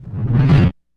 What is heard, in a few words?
IMP FLAME, Cinematic Impact